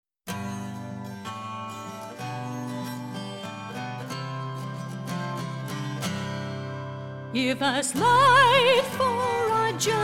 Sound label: light journey